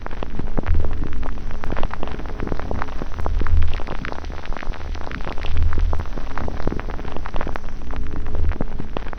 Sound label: loop, techno atmos